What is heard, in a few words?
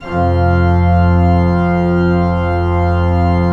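ORG F2, KEYS; Synclavier Sampler Library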